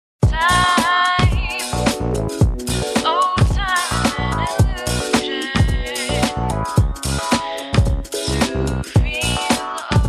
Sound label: Chillout Funk Fusion